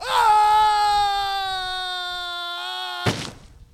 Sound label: splat; intoTheWoods; narrator